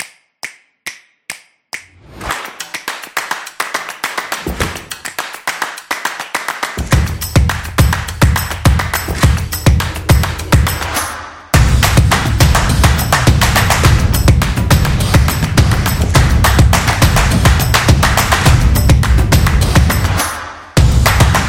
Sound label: Stomp Typography, drums trailer